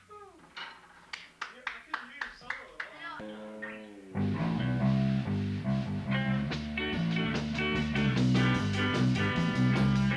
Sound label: Random Country Ska